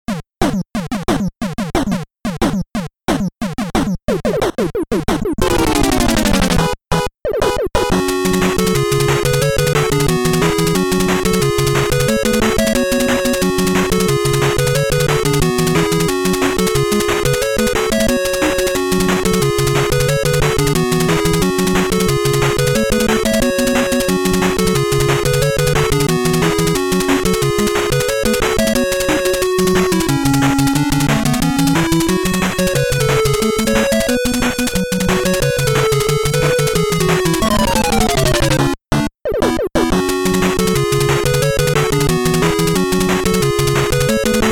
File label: Retro Gaming Stage; timmy turners gadget room 2020